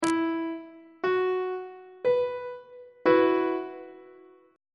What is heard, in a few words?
d double sharp suspended 2nd chord on treble clef